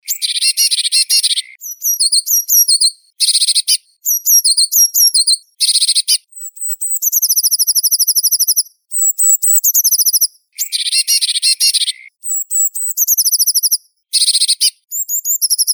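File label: blue tit, Cyanistes caeruleus; lazorevka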